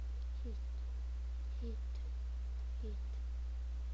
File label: hit